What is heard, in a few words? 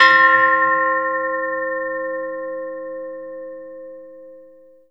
Pipe bell